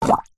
water in metal container single bubble blow through plastic flexi tube; foley